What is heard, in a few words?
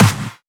Snares; Vengeance - Essential Clubsounds Vol.4